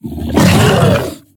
Concavenator sound; ARK Additions

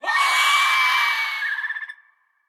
Icthyornis sound

